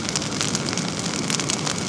FIRE; outlaws

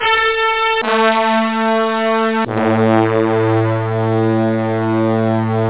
Trumpet; media show; Instruments

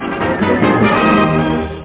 End Music; IconQuest

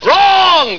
WRONG!!!; PowerLaunch Lite 1.6F

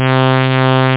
Flange; MarcDrive

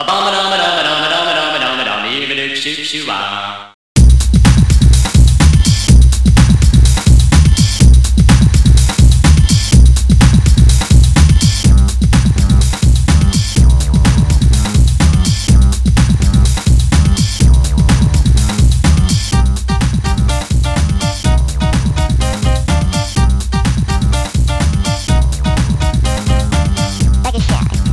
jingle one; OctaMED SoundStudio V1; mug-members

